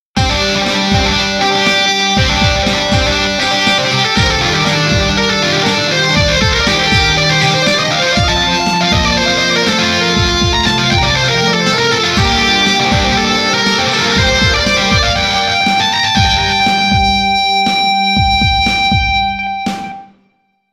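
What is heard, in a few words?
distortion guitar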